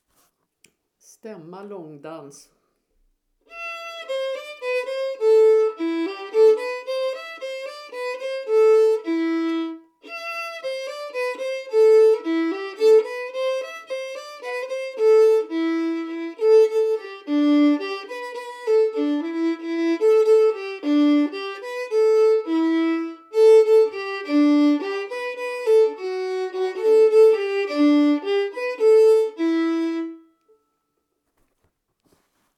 Stämma låndans

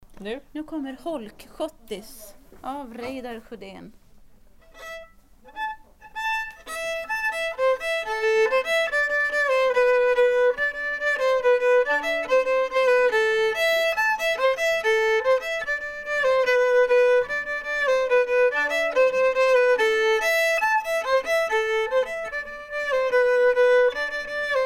Holkschottis

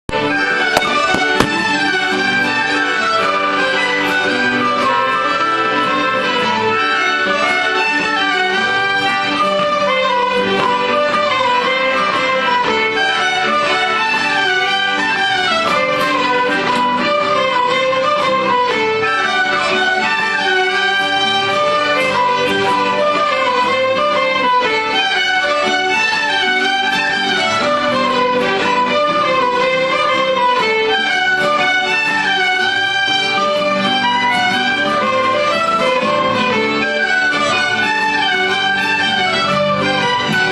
Polska; Torbjorn

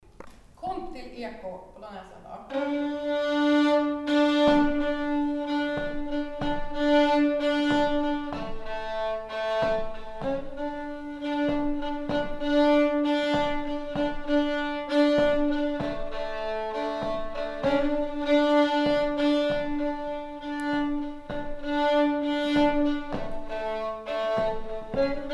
Stämma pollonäs

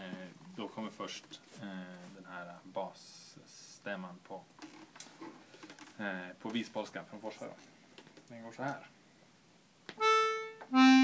Vispolska från Forsa, stämma

